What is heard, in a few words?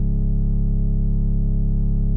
hum; gothic world